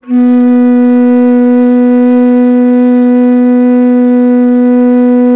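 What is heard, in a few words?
bass bansuri, World Winds; E-MU Producer Series Vol. 7 – Old World Instruments; World Combos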